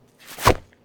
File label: Jumping On Grass